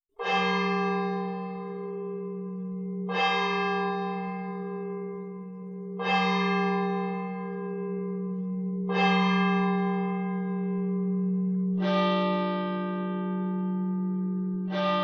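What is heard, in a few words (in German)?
12 Uhr, Glocken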